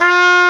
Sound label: cornet F4; AKAI S-Series CD-ROM Sound Library VOL-2